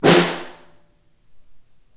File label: Far, Snare